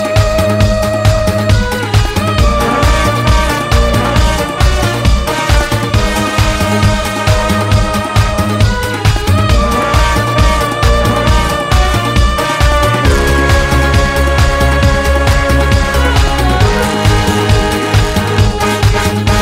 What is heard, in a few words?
sad music ringtone, bollywood